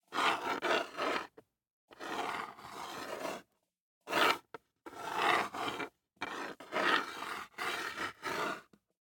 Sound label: DICE on Hard Wood, Slide, Standard, 1 One Die; Samples; ArticulatedSounds; DICE Sfx Party (Extended)